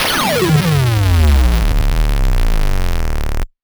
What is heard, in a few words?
Sweeps Down; Vengeance - Essential Clubsounds Vol.4; Effects